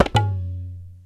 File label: INDIAN, DrumBase; Crypton - DrumBase5000